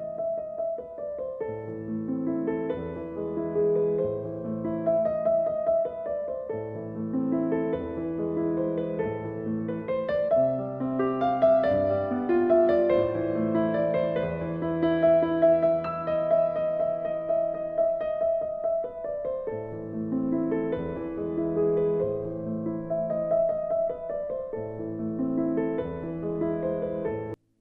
fuer elise; feud; dod

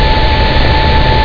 cpu FAN

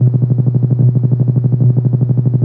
hold loop

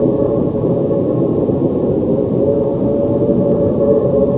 moon, ambience

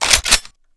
saw bolt; svencoop

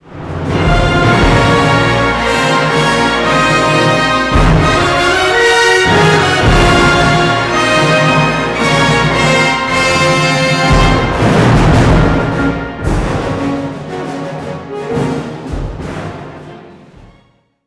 german win

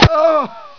pain

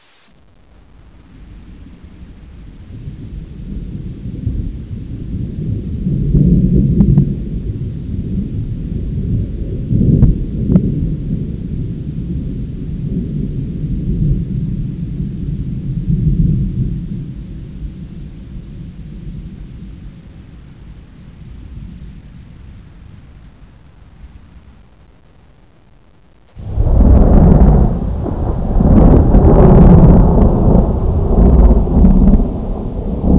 thunder; svencoop

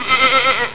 sheep

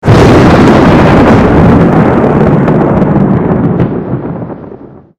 thunder; crampman

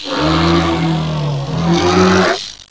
geneworm final pain; svencoop

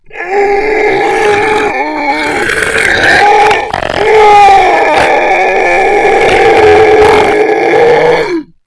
moan loop

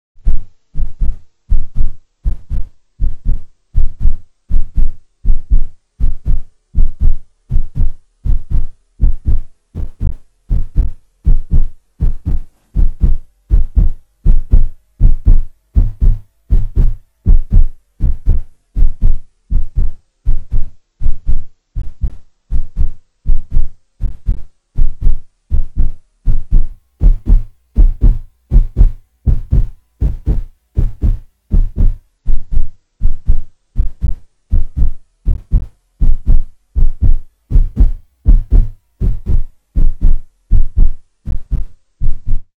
spoki hert beet; svencoop

